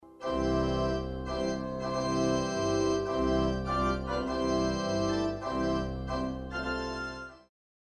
Casio Privia PX-5S church

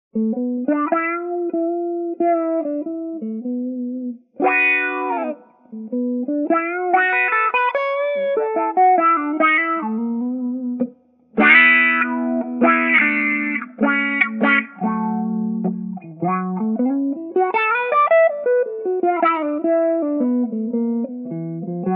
Touch Wah Funk Solo; Wahoo

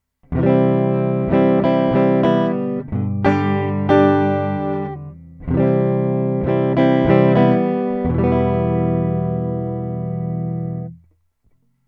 BLA B12A SSL SiX HiZ

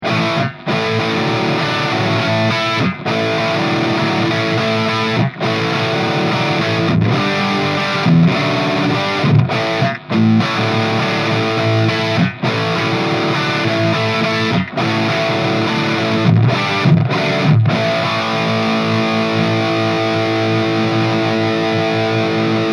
Lead Boost

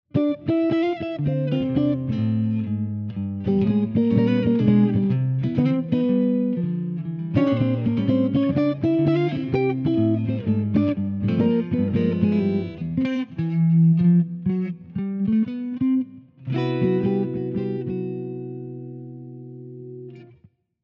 Jazzmaster Jazz Dark

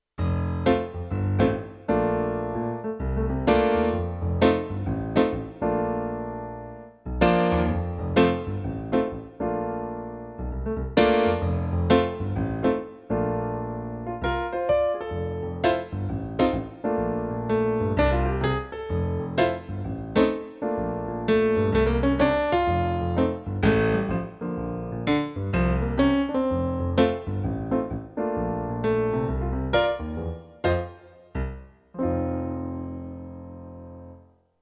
BK9 Grand Piano

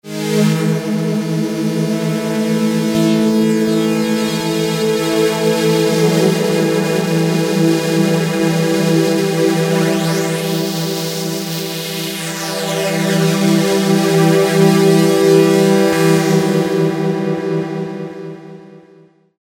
Poly Unisono

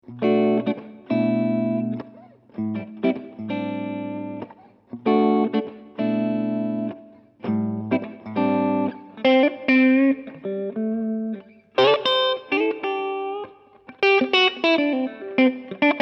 clean mid

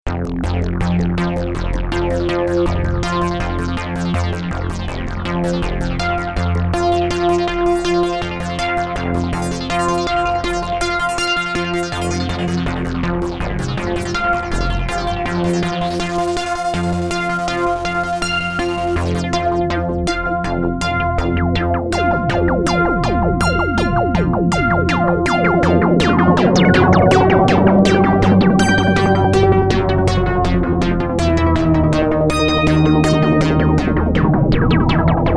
REV2; Action On Wheels

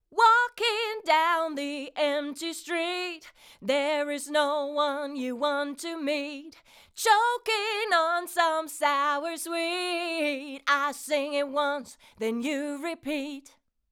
Rupert Neve Designs 5254 Vocal Auto; GR 5 dB

